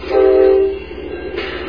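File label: train horn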